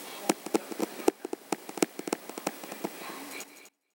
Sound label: home made drum